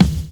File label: BD FUNK; E-Lab; X Static Goldmine 2; akai